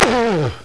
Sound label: SPIT; Leisure Suit Larry 6 - Shape Up or Slip Out; Floppy DOS; scummvm